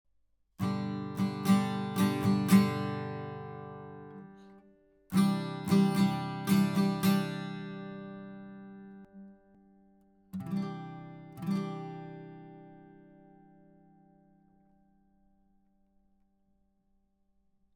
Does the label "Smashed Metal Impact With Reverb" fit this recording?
No